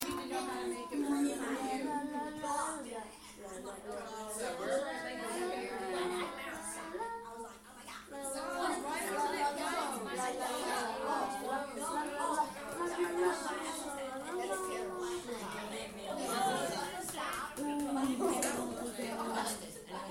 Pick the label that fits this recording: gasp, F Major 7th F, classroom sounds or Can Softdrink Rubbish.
classroom sounds